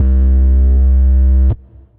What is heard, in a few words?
Bass, Synth Bass; Sneak Bass; Ableton; Beat Tools